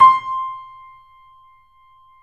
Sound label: 3D Pianos Yamaha C7; E-MU Producer Series Vol. 5